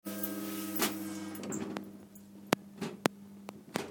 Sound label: electronic door